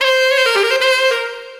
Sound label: Sax Funkyturn; Sonic Foundry (Sony Creative Software); Knutrix Drum & Bass